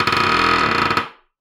Door Creak

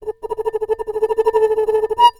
Bowed, Large Wine Glass

